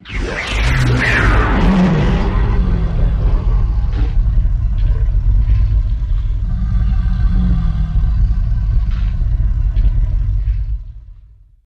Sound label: Animal Scream Explos, dinosaurs